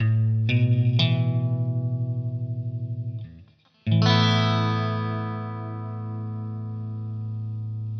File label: asus2